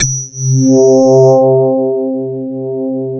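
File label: synth, Drum & Bass, 160 BPM; Ueberschall; Drum & Bass Flash Trackz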